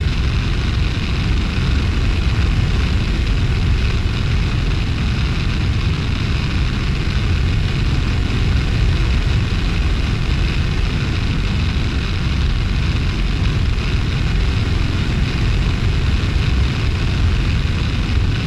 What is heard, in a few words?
thruster burner; platform thruster; Factorio